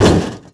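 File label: metal soft, Impact; Cars; XBOX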